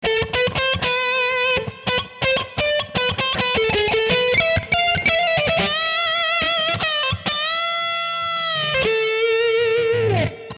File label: Am2 80-100 solo blues